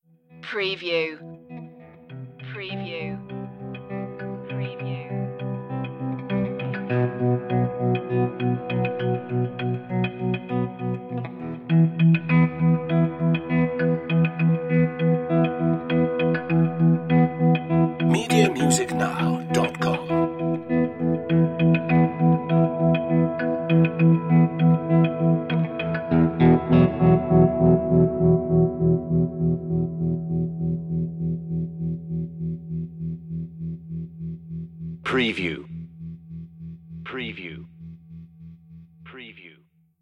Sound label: thoughtful